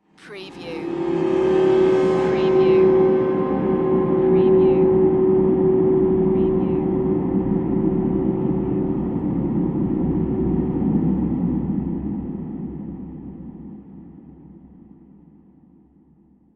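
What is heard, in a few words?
Production Element Swell Cymbal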